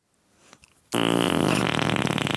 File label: giga fart